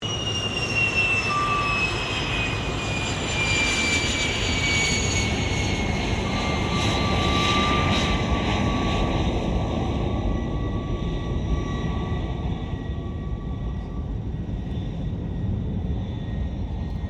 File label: AIRCRAFT GLOSTER METEOR F MK8 TAXI; Gloster Meteor figter jet; Airplanes; Vehicles; Aircraft Collection; Twistedtracks